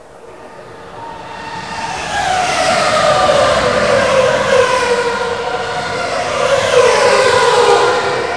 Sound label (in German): Rennwagen